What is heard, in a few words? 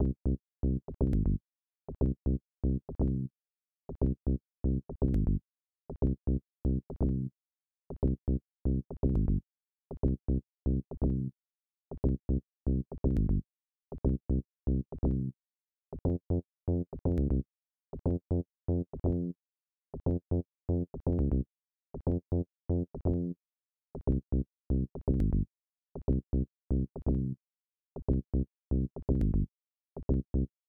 Heart Beat, AdLib; Blake Stone Planet Strike; MS-DOS